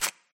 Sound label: card slide